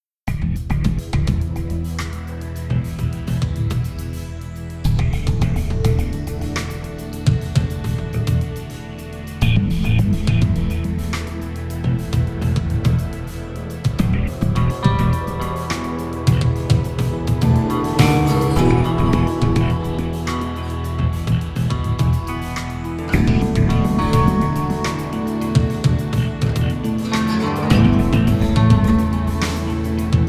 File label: Music Rhythmic Tension No Guitars; starcraft 2 wings of liberty; MissionMusic